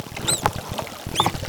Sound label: chirp